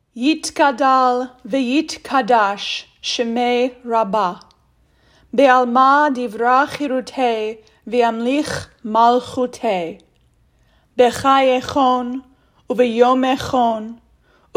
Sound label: Kaddish, prayer; bnai mitzvah